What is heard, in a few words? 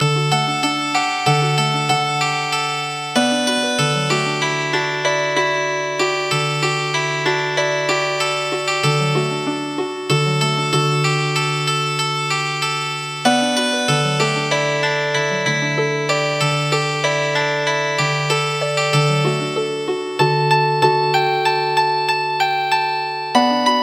Japanese Processional Tune Healin Harp